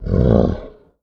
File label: bear; wagic; mtg